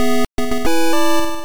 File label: Kick Off; arcade; Gridiron Fight